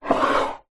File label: household glass perfume bottle drag on wooden dressing table